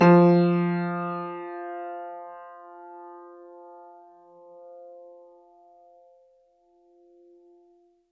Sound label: Grand Piano, Kawai, Sustains, F2; Chordophones